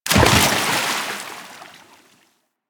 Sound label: Land Water, Footsteps; Warframe; Tenno